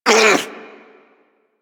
Armadillo Vocal Pain; Characters; Warframe; Hunting Critters

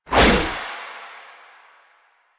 Skana Whoosh; Melee; 1999

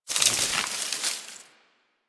Creature Scurry, Ambience; One Offs; Warframe; Quests; The New War; Drifter Camp

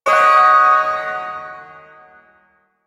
Ersatz Shawzin Yo Chord

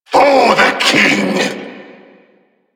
attack vocal; Warframe; Duviri; Dax; Vocal Set B

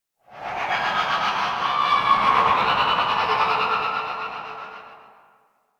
Void Connector Shriek One Off, Ambience; Warframe; Citrines Last Wish

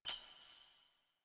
Shard Focused, UI; DE; Warframe; Helminth Shards

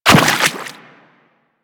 Run Water, Footsteps; Warframe; Teshin

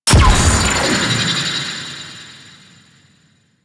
Medusa Button Activate; Sentient; Warframe; The New War; Sentient Devourer

